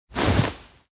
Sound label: hug; anemoi